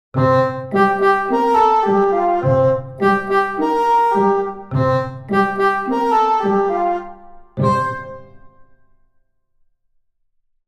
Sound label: comical